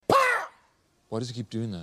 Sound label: pow